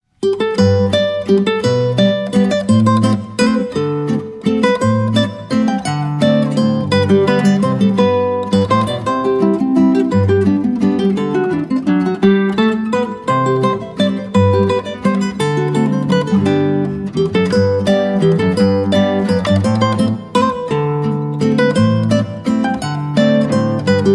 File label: Vogerl-Landler